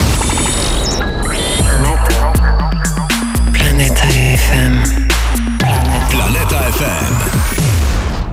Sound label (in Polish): Jingiel